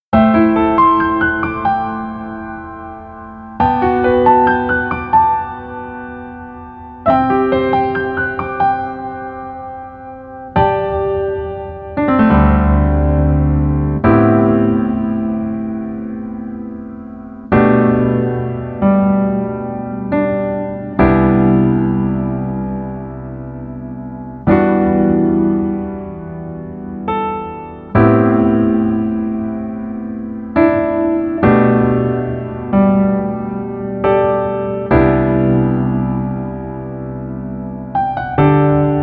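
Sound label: Piano version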